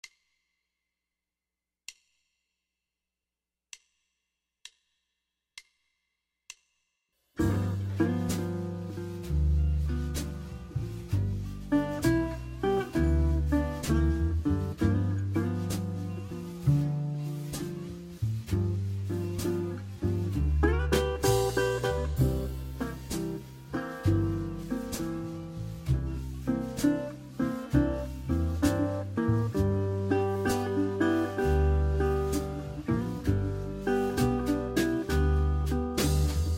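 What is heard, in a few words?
Blues with 1 bend 65, harmonica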